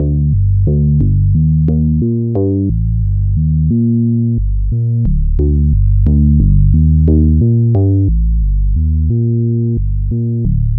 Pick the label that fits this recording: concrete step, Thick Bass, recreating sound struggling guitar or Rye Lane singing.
Thick Bass